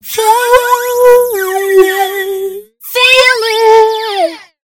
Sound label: Stereo Flanger Female Vocals Wet Through Zero Sweep; Sonicircuit; Syntheway